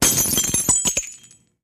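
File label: 1 Glass Jar Being Dropped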